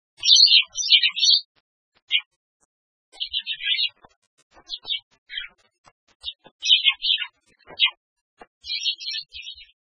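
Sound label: sparrow; suzume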